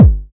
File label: smooth bass drum one shot g sharp key, Royality free bass drum one shot tuned to the G# note